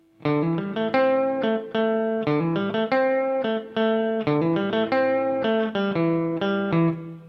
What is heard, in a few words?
Clean Both